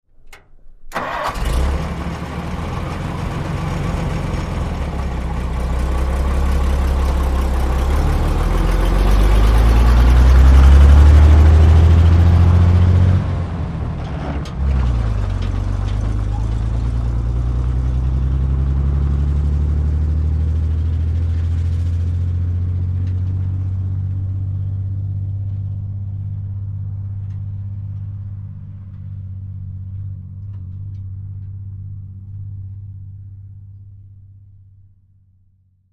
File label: Truck 2.5 T Start Idle; 2.5 ton